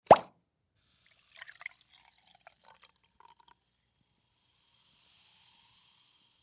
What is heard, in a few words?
Champagne Bottle Pop